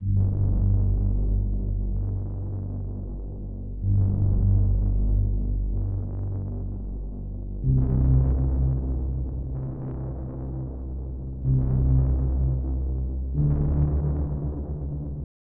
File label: 126 BPM G Min Bass; Melody; Loop Stems; Cymatics; 2022 Melody Collection Platinum Expansion; Go Faster